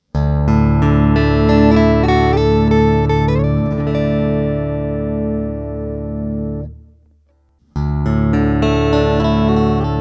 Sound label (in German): tonabnehmer edulcimer